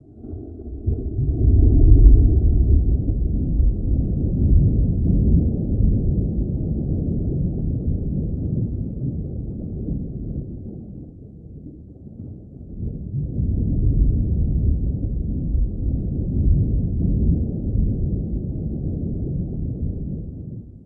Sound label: Ship Maneuver Underwater